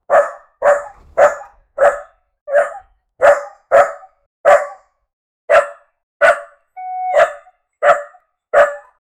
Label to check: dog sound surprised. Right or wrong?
Right